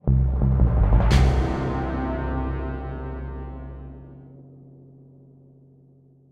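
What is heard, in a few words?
Music Stinger Capture; Griftlands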